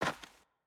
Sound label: Footstep Dirt